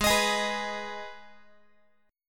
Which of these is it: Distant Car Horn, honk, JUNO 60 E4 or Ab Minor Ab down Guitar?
Ab Minor Ab down Guitar